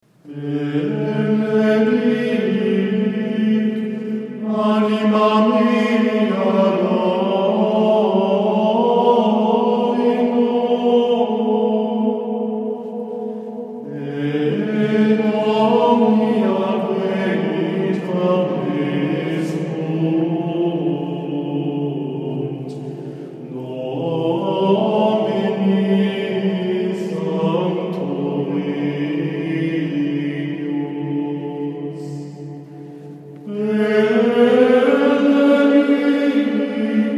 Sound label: Introitus Antiphon, schola; Benedic anima; o clemens o pia